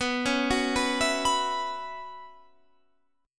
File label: B Suspended 2nd Suspended 4th, Guitar, Standard; 19,16,16,16,17,19; Bsus2sus4 arpeggiated; capo=3